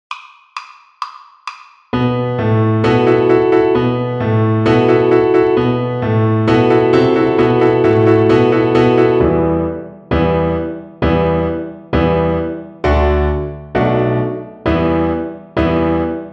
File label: String Gym, Piano Accompaniments